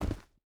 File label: footstep rubber boots dirt jump land; Rust